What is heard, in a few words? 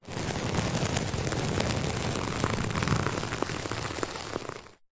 small sharp spike single long scratch carpet; foley